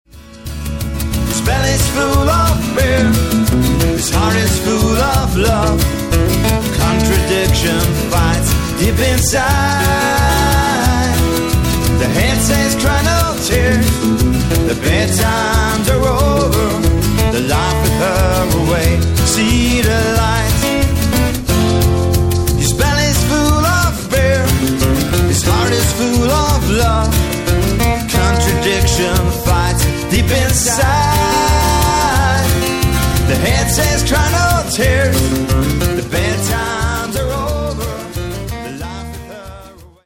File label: Solitude Acoustic Jam